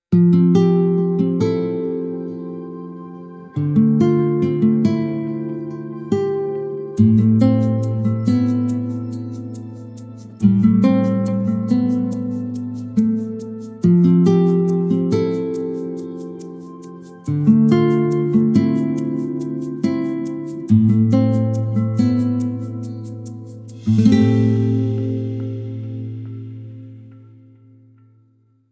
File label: tranquil minimalist guitar